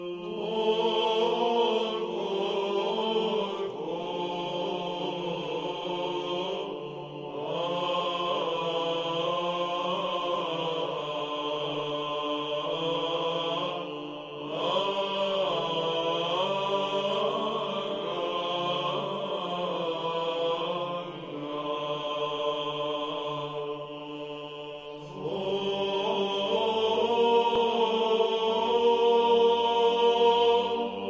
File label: koinonikon ambo